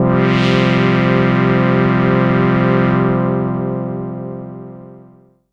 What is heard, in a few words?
SYNTH Long